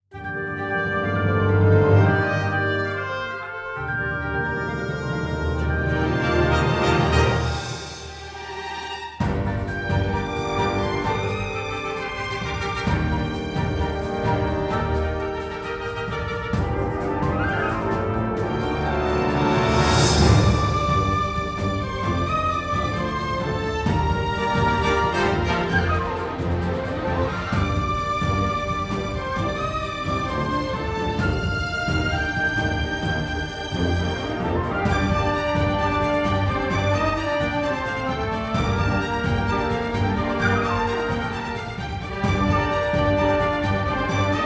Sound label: Epic Drama